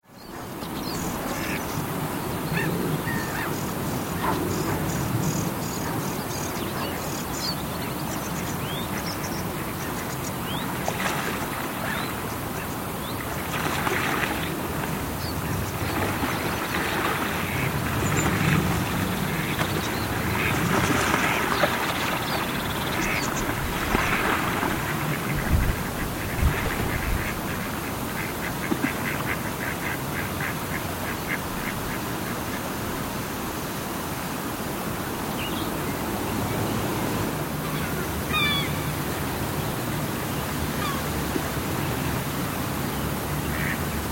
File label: longmoor pool sutton park